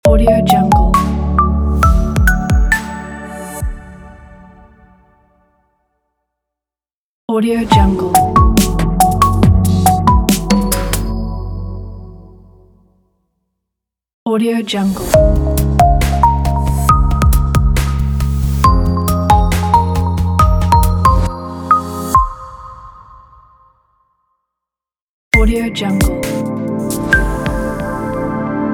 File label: marketing logo pack